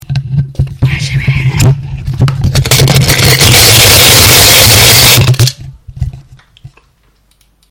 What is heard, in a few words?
asmr coins